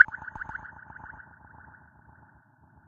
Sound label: Drop; And Yet It Moves